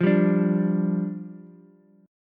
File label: D Minor Double Flat 5th, down, Ukulele; Dmbb5; strummed; JGuitar